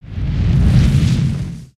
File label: Spinjitsu Fire One shot